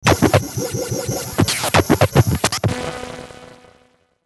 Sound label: Glitch Sweeper High Frequency Short Static Stutter Fizzle, Glitch Electrical Stutter Warble; Blastwave